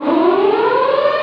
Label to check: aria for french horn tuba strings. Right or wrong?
Wrong